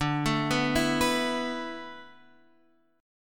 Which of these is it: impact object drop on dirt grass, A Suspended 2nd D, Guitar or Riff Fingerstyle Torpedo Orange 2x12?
A Suspended 2nd D